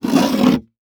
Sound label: Impact PVC Pipe Dragged Across Wood Floor; Impacts